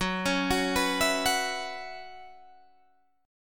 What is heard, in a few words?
F sharp 7th Suspended 4th, Guitar, Open G